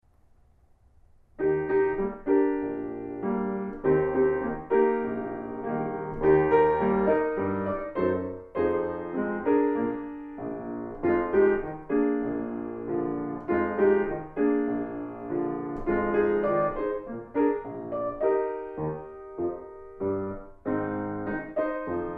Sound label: Lullaby Rag